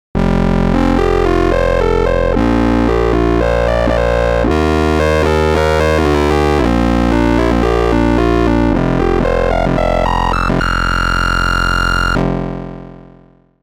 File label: Duo Phonic; Gforce Oddity 3